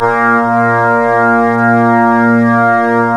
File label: ORGAN, A#; Big Fish Audio; Synclav FM